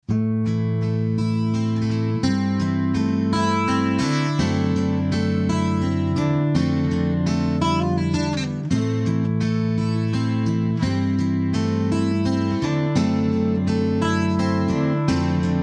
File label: Martin D 28; James Tyler Variax